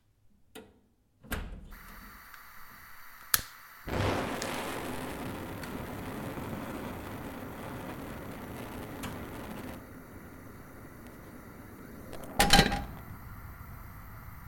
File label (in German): gasherd; Des Ohrenblickers Gasherd